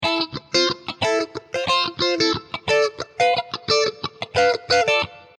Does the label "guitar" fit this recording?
Yes